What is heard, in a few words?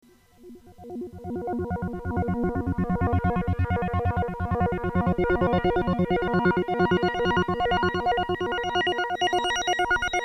dx7 arp; Yamaha DX7; Arp pad